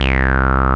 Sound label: BASS, Analogon; A-BASS 2